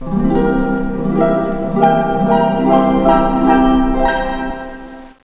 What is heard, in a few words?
harp